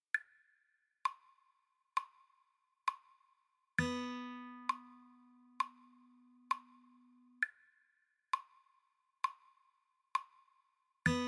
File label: Rhythm Practice slow, guitar